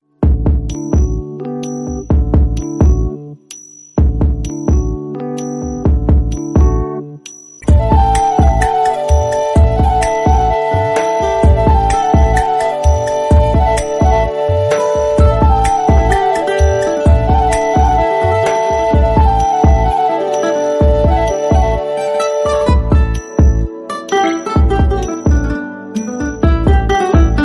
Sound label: instrumental bgm telugu